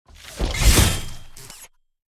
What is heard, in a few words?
Pruning